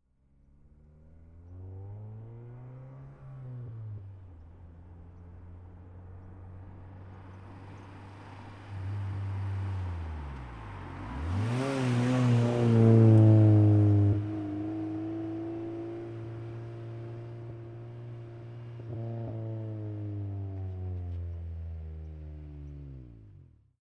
Integra, long distant approach, fast by, long away, Acura Integra; acura integra ext.